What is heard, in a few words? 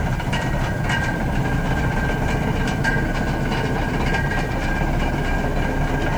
idle, vehicle; liaz677